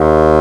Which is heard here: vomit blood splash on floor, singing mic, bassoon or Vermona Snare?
bassoon